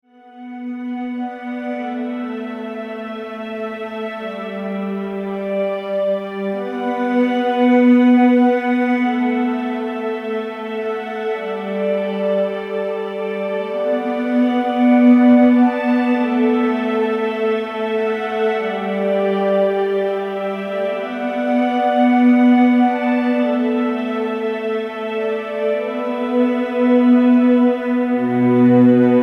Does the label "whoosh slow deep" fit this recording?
No